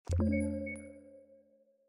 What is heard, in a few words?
mining claim sfx